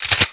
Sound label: slide